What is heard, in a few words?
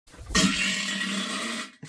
powerful fart on toilet